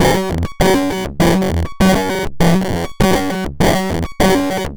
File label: Modular Loop, 100BPM; musicradar; bitcrushed; Crushed Loops